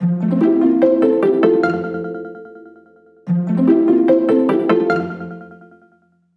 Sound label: system ringtones; Amazon Fire-Phone